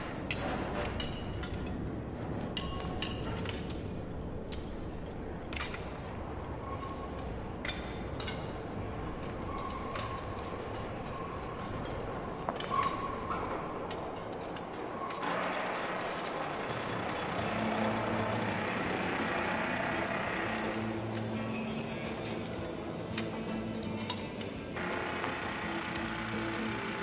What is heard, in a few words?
Mine; Club Penguin Online Unofficial Soundtrack